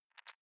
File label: click noise, signale